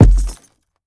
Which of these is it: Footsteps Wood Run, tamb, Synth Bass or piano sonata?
Footsteps Wood Run